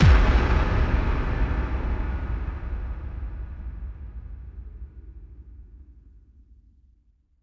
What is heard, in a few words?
PWM Kit 142 BPM Fx Kick; Vengeance - Essential Clubsounds Vol.5; Demo Constr Kits; Root Gmin